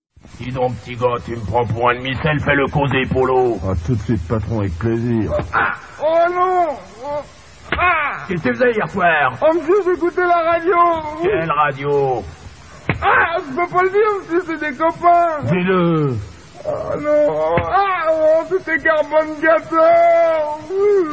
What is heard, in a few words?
jingdur